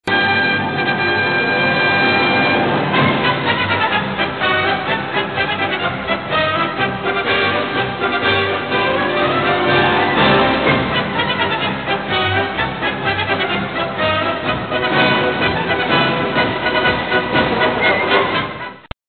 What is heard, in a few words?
Circus Tone